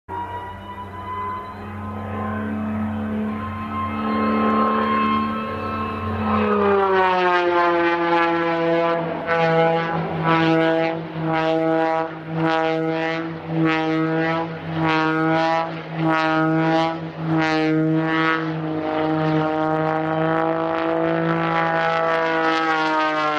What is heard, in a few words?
Challenger Biplane